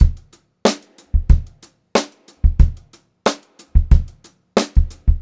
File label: Funk-Groove Drumloops